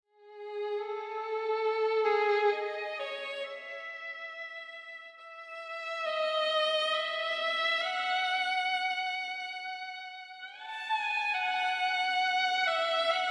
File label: Lullaby Violin; stasis